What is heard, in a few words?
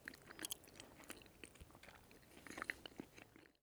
sprats eat; Escape from Tarkov